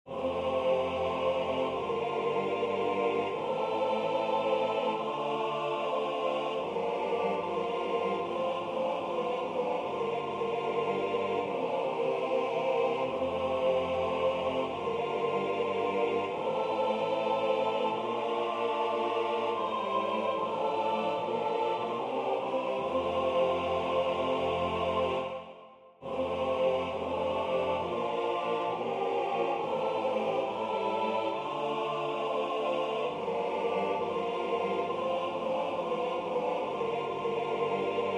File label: All night all day SATB; gunsenheimer